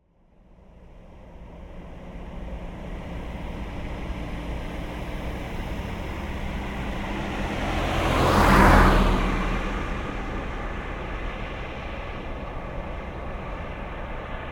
Seat Ibiza III 1.2 12V exterior passby fast whoosh pulsing; stereo; Soundholder